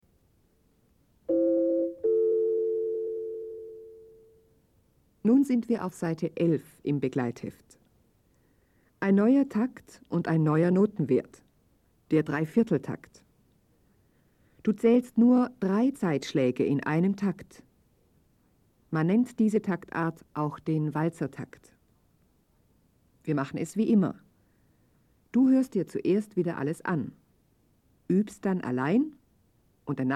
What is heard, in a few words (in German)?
Spiel Blockfloete